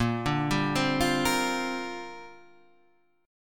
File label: A sharp 6th Add 9th, Guitar Half Step Down; A#6add9